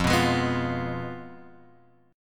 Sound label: F Diminished, Guitar, Open G; down; chord shape